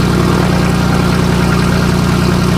airboat fan motor idle loop; Source Engine; video game; vehicles

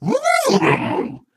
ballbot impressive

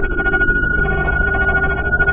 warning sfx loop; ambient; launch; source engine; ep2

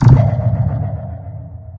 combine gunship ping search; Source Engine; video game; npc

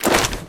swish weapon swing swipe

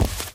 walk tile, footsteps

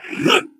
jump; Portal 2; Source Engine; video games; ballbot

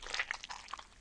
item gooey pickup

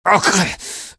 pain, barney; hl2; Source engine

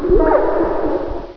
boid idle; source engine; hl1; video games

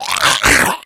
death, infected

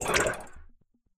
editor cube explo; Source Engine; Portal 2

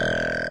roller code; Source Engine; video game; npc

